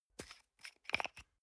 toothpaste screw cup open medium

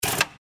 switch

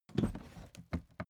suitcase open case quick

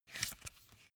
magazine flip back soft

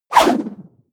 swoosh

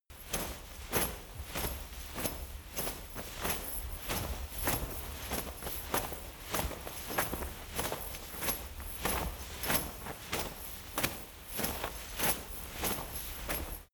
chain mail walk

